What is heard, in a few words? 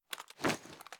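kilo98 reload empty fast movement; gmod; viper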